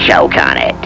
choke

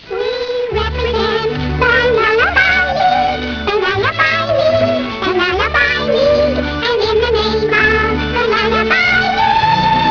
lullabye; Lullabye League; Wizard of Oz

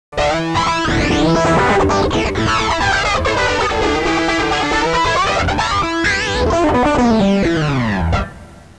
RIFF

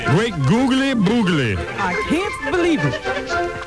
BOO

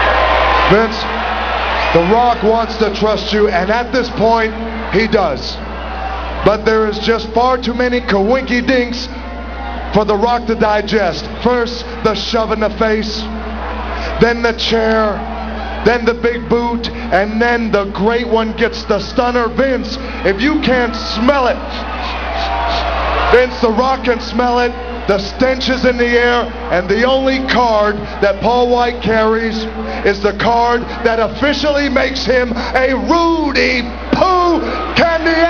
rock

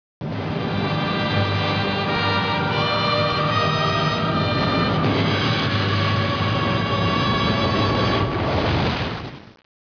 intro music; hellraiser

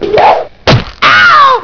oww

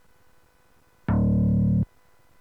bass, FM Synthesis; frequency modulation synthesis; John Chowning

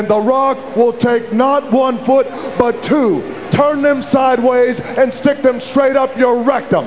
rock

